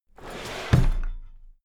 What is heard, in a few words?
drawer cabinet equipment close heavy